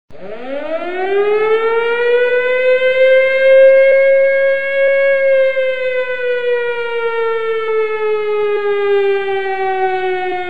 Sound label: air raid siren